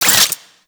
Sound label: Necramech Repair Drone Idle Short; Warframe